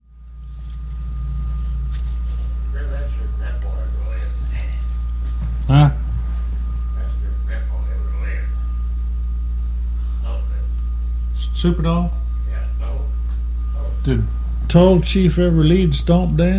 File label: Stomp Dance